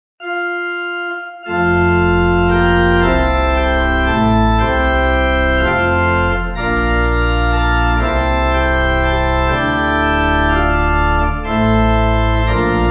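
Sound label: Kyrie, Pipe Organ; Merbecke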